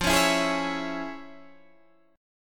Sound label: F Minor Major 7th Sharp 5th Guitar Open D